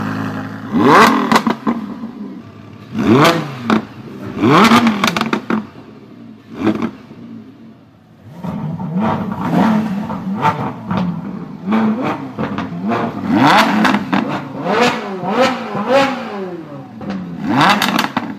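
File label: Lamborghini Huracan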